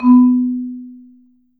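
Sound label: Marimba medium F B2, marimba; Sampleheads - Dave Samuels Marimba & Vibes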